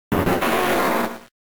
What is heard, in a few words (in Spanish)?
Grito de Grovyle